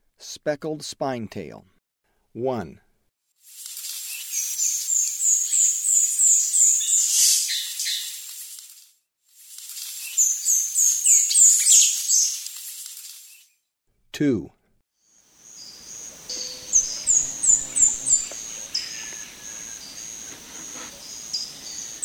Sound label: Speckled Spinetail; S. America; Eastern Lowlands